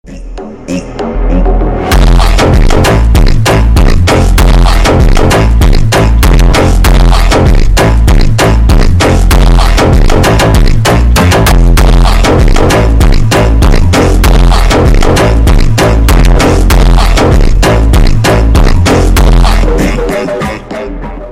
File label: super slowed reverb; Фонк